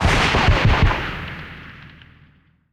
12mm tails far, guns tanks; War Thunder

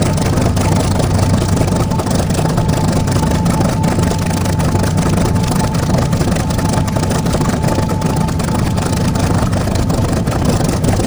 Aircrafts Engines Jumo213A; DCS

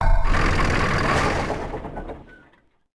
Build Tank; Civ4 warlords

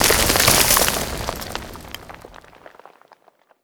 ceiling debris; Call of Duty Modern Warfare

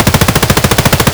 mpx outdoor distant loop; Escape from Tarkov

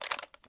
rifle plastic magin fail; Escape from Tarkov

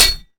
metal small

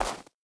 boar footstep snow hard; Rust

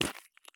run gravel; Escape from Tarkov

